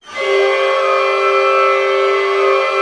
ORC CLUST, Chord Cluster; String Master Series

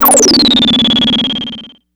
Moog one shot, Synths; Sonic Foundry (Sony Creative Software); Electronic Point Blank

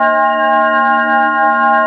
A#4, TURBOBELLS; Northstar; The Wizard VOL-1

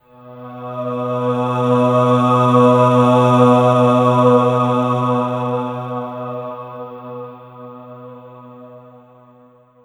PAD, Drum & Bass, 160 BPM

